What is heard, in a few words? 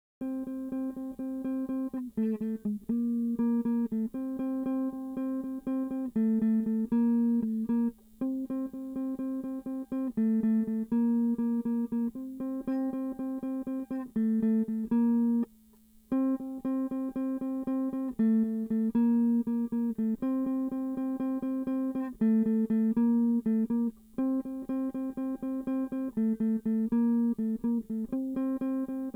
Bass; Bass Valley